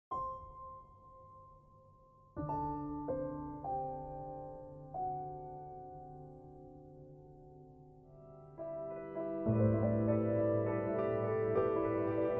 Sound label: Interlude for Piano